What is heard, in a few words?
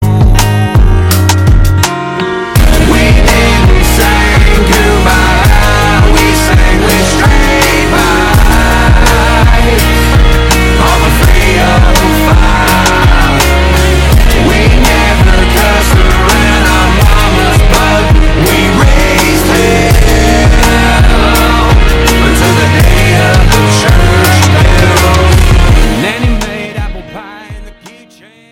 Bells